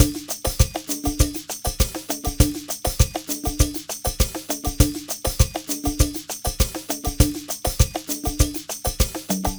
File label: Ensemble, Latin Percussion